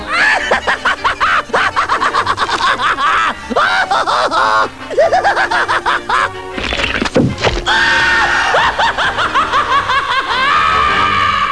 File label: haahahah